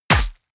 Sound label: bullet large impact body